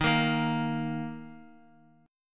D sharp 5th D sharp, Guitar; strummed; 10,13,10,x,x,x; JGuitar

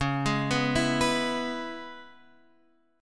C sharp Minor 7th C sharp Guitar Half Step Down; C#m7

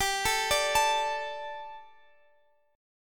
G Suspended 2nd G down Guitar Drop D

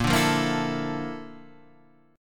A sharp Major Sharp 11th, Guitar, Standard; A#M#11; strummed; JGuitar

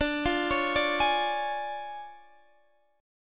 D Minor Major 7th Flat 5th D Guitar Drop D

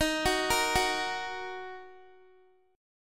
Eb Minor Eb Guitar 4E 4A 5D 5G 5C 6F; Ebm arpeggiated

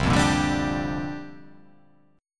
C 9th Suspended 4th C down

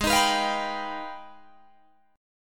G sharp Minor Major 13th, Guitar DADGAD; strummed; 18,18,21,22,22,21; G#mM13

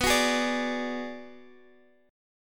B-Minor 6th B down Guitar DADGAD

